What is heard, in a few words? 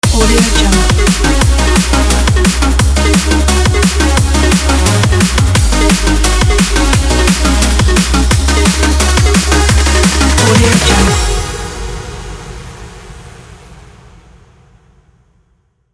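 energetic intro ident; boxbeats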